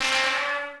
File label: program error; windows 98; 60's USA